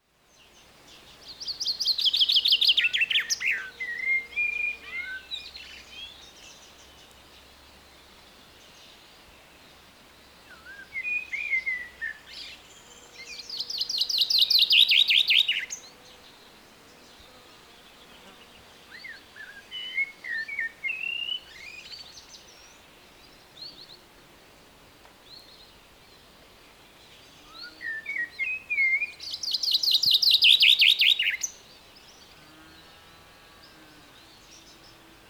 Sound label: Fringilla coelebs; SETE